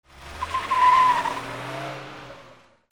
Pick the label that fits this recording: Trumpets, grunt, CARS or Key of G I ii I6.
CARS